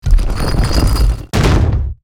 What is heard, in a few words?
Ark Open; foley